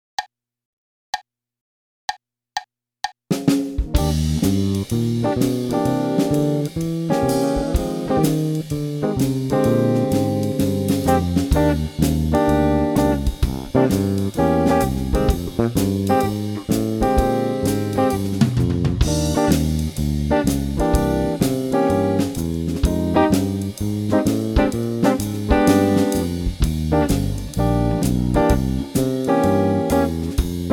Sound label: jazz guitar voicing over V without root; bergeron guy